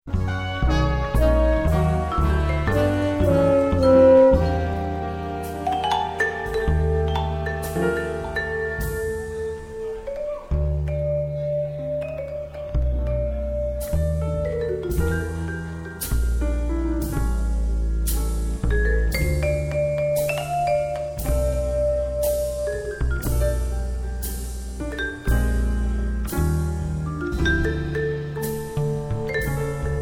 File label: heart strings